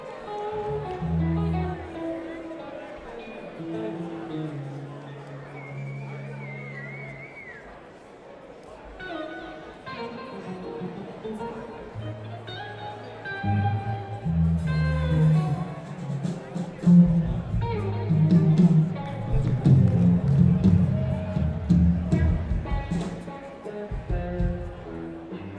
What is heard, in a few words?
crowd